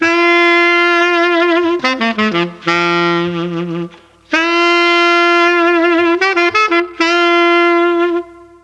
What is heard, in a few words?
A3 SAX, Mellotron; Mike Pinder - Mellotron Archive CD; FOXTROT+SAX